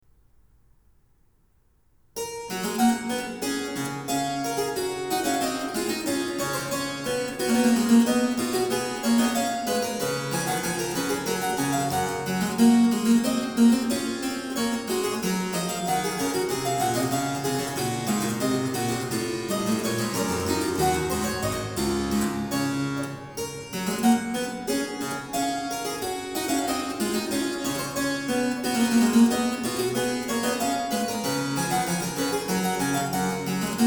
Goldberg Variations, Variatio 18. Canone alla Sexta. a 1 Clav, Harpsichord; Bach, J S; BWV 988